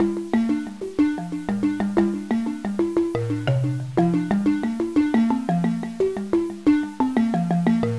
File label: balafon